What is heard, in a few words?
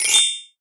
good condition; 摇钱树; ITEMS